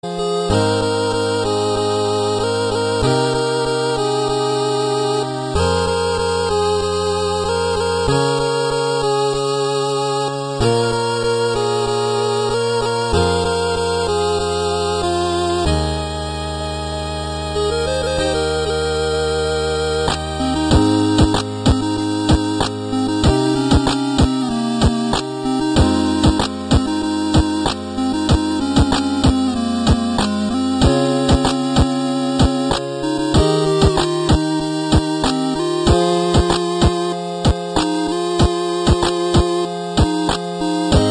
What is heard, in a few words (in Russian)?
8-bit muzyka na sotovyi telefon ringtony